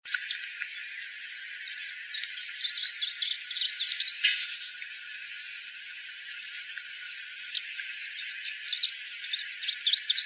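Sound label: Warbler; Vermivora Parula Dendroica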